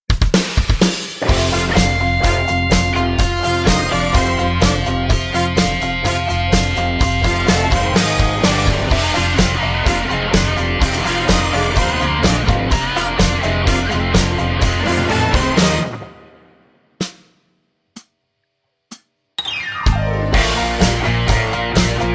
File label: (F) No Backing Vocals